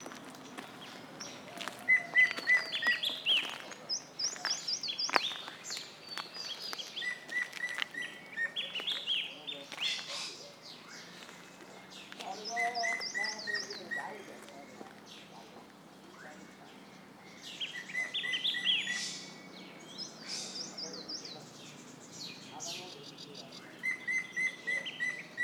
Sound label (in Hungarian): hamvas kullo Picus canus